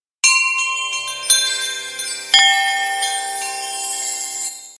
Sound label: Bells